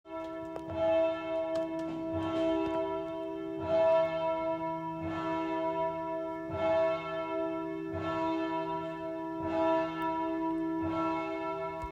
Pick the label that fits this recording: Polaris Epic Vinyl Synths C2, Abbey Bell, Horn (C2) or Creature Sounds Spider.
Abbey Bell